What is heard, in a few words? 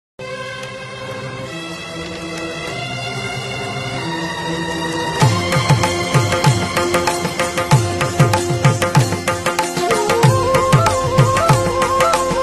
danger bgm ringtone; chandramukhi